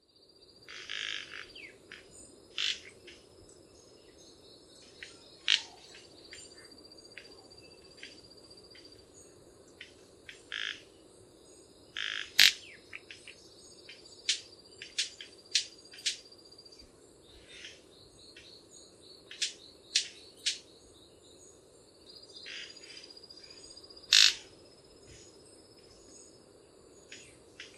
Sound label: manacus candei, Aves; Gandoca-Manzanillo; historia natural; Galería Biodiversidad